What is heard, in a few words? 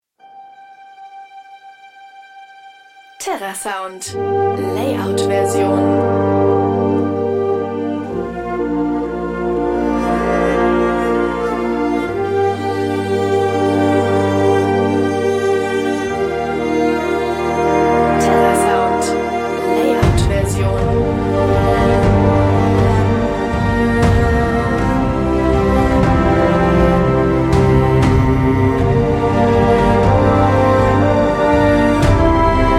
inspiring cinematic trailer; terrasound